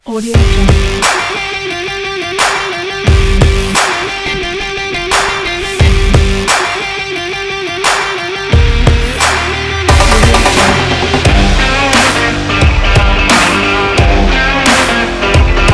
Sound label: strong action big beat